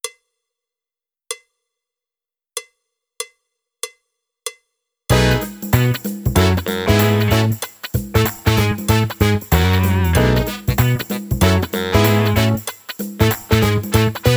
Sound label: Funk drumless